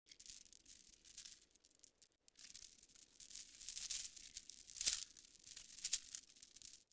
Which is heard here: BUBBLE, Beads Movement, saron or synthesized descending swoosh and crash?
Beads Movement